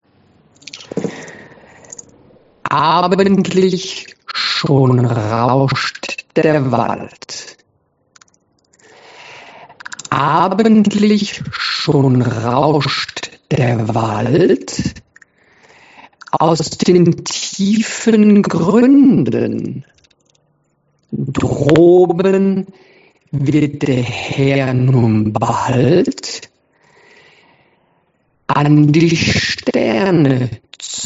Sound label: SLOW; Abendlich schon rauscht der Wald